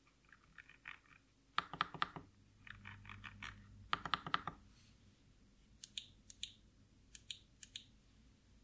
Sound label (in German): Knopf und Lichttaste; K65 Plus